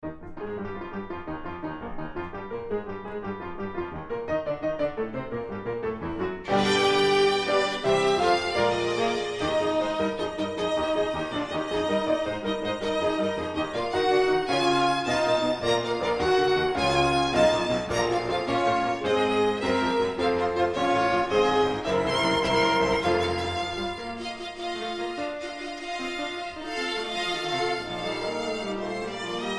Sync Wavetable Soni

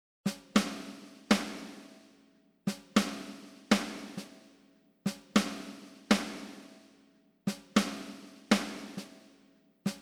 Wave Drum SEP SD

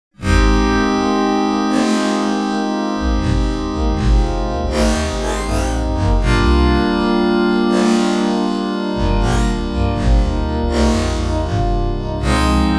Pop

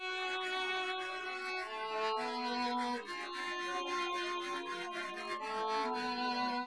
Castanets Violin Applause

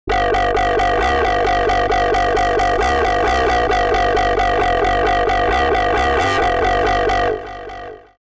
Synth Mono; TSMtoolbox

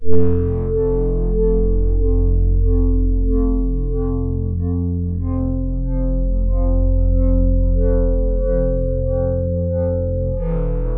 Synth Poly

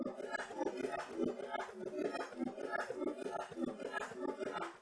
Bongo

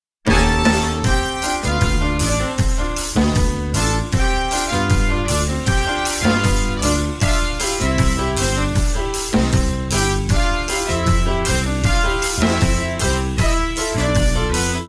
Jazz; TSMtoolbox

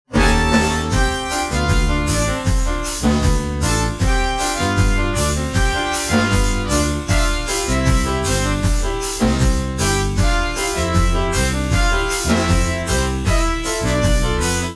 Jazz; TSMtoolbox

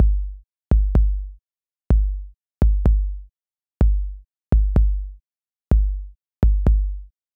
Techno Drum KD

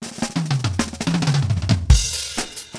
Drum Solo

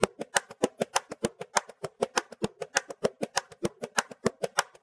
Bongo

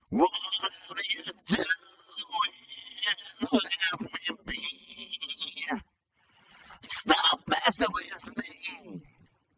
Singing Voice

